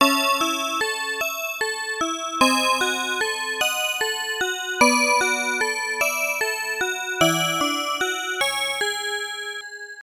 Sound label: OPN normal